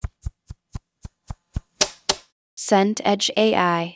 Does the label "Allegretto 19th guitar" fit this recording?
No